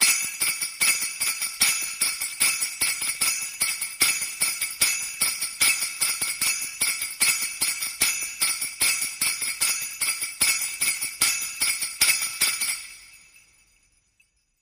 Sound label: Winter Sleigh Pulse